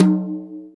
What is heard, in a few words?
low mid tom sound f sharp key, tom drum one shot tuned to the F# note; tom drum samples